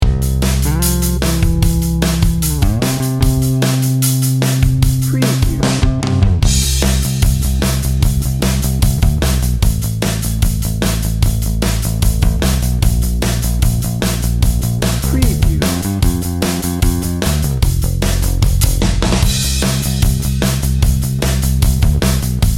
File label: classic corporate rock dnb